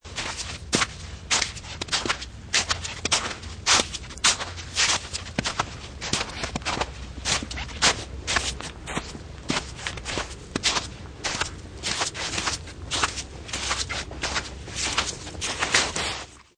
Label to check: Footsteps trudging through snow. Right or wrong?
Right